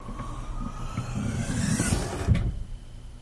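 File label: Hatchback Hydraulic, trunks & hoods; Hatchback Hydraulic Long, No Bumps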